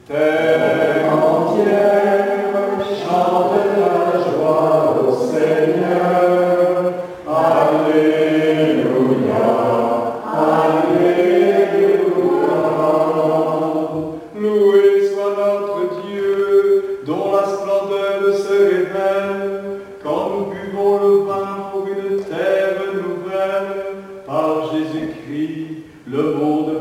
TERRE ENTIERE CHANTE TA GLOIRE

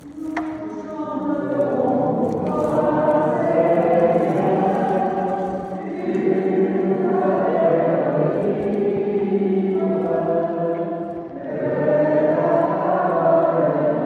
priere de louange nous chanterons, EGLISE; LOIZE 79; PENTECOTE

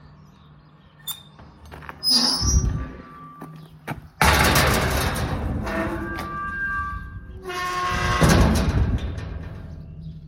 sortie, BRUITS DE PORTAILS; SAINTE BLANDINE 79

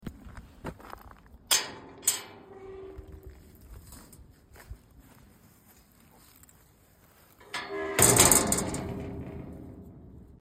ANCIEN entree, PORTAILS

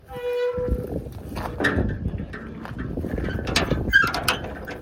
sortie portail; LEZAY; BRUITS DE PORTAILS

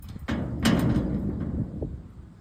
PORTAIL; Cimetières du Mellois

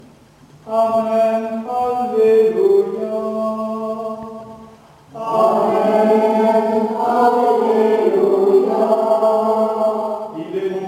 AMEN ALLELUYA

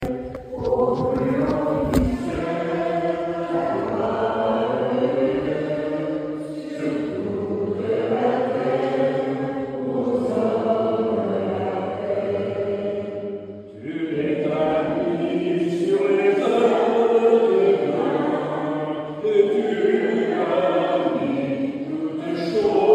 GLOIRE A DIEU; LUSSERAY

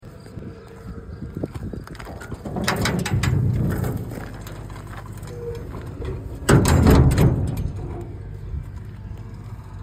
PORTAIL ENTREE; VILLEFOLLET 79

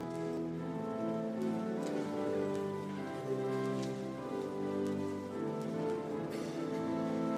JINGLE LAUDATE DOMINUM

